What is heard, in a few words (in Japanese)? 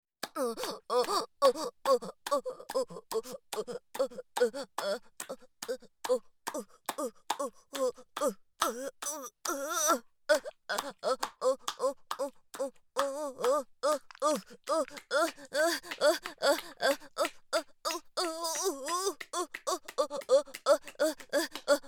オホ声; SEあり